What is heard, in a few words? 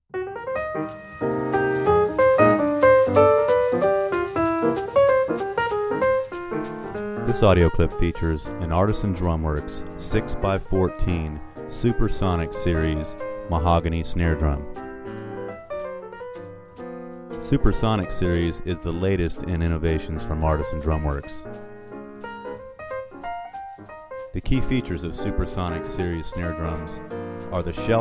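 mahogany jingle with voice over, drum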